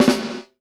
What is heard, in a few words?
FLAM VERB, PROC SNRS, Drumworks; Sampleheads; New York City Drumworks VOL-1